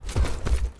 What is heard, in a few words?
run